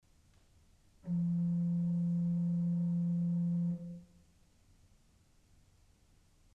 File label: Principal 4' f, orgel pipes